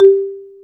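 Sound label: XYLO G 2, percussion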